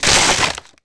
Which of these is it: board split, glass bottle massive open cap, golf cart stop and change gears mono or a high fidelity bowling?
board split